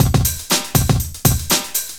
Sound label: LOOP120; Sounds Good - Eurotech